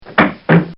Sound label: Fall And Bang; Injuries; Combat